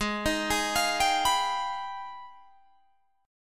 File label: G sharp Minor Major 13th, Guitar DADGAD; G#mM13 arpeggiated; 18,18,18,22,22,21